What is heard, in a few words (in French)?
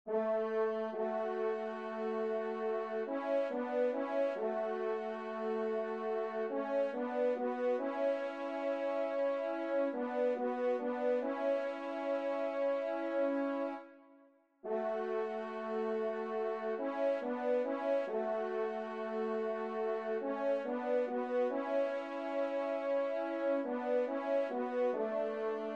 TRP; Vert Galant